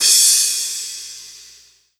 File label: Crash High Long; ColomboAcoustic; pitchglitch